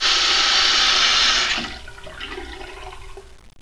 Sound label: FAUCET